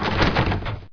latch unlocked; mistake coop

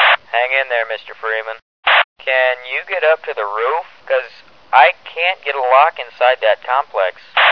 roof, barn; redbarn

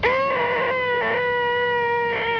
door move; svencoop; cracklife

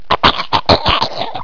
pl swim, player; svencoop; cracklife

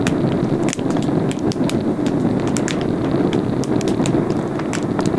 fire; svencoop; gruntsparty; snowboxes

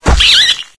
smash, roach; mistake coop

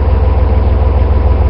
car passing; svencoop; pubsec

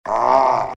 pit drone pain, goblin; svencoop; legends

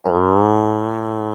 cow melee; svencoop; cow tycoon; gonome replace

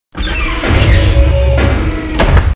turret deploy; svencontra2; stage1

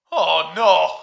pillar break; svencoop; mustard; maxwell

